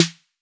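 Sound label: 808 snare drum; Electronic; Wizoo - Platinum 24 Electronic; CHR SD 808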